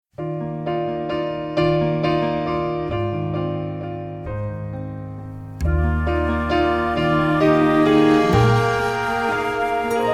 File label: INSTRUMENTAL